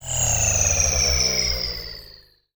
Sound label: Space Age Motor Cycle, Sci Fi